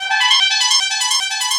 Trombone Effect, effected trombone

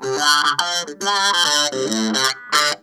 T-TIME, Funk Guitar; 90 s Sample CDs; Zero G

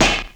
KIT, Dance Mega Drums, HIP

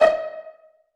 STRING PIZZ D#3; Orchestra; Sound On Sound - Peter Siedleczek's Orchestra

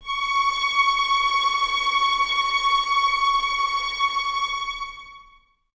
Violins Tremolo C#6; 23 Violins; String Ensembles; Miroslav Vitous

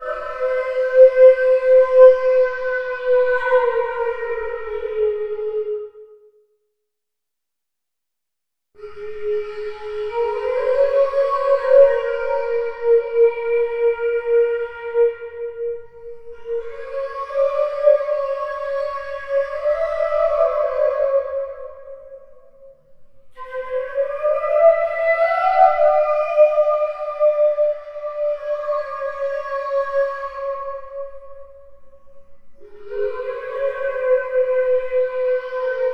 Saturn Flute; Sonic Foundry (Sony Creative Software); Ma Ja Le Ethereal Textures; Flutes

